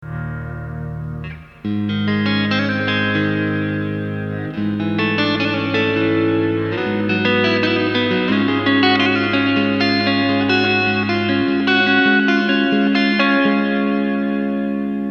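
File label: BOSS RV-5 Digital Reverb, HALL; E.LEV:5 TONE:5 TIME:5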